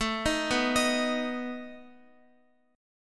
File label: A Suspended 2nd Flat 5th A down Guitar